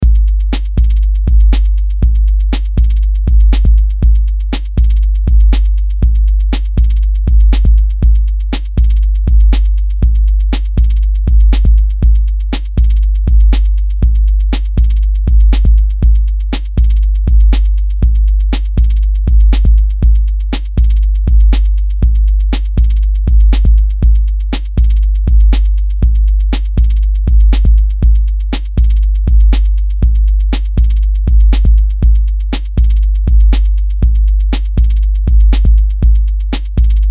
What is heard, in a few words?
BEAT OLD SCHOO, Beat Loop Old School Rap Hip Hop Break Beat